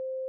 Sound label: sfx chime